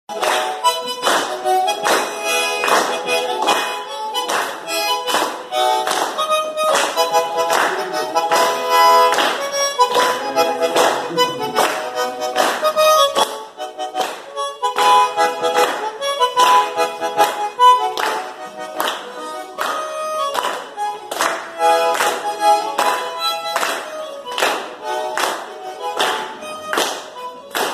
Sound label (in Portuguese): música de gaita de boca